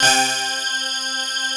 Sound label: HOLLOW SYNC, SYNTHS; Pro-Rec - Synthsonic